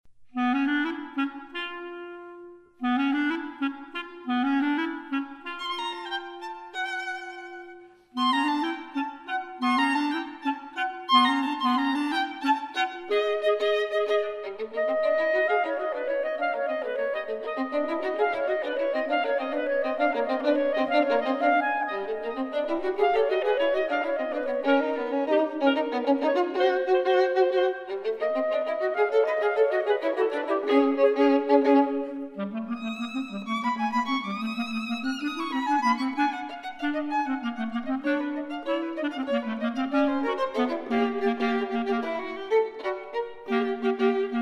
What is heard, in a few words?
duo violin clarinet